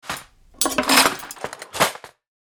Kitchen drawer cutlery rattle

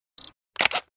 Camera shutter click autofocus with flash

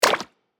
Aquatic water game menu ui confirm

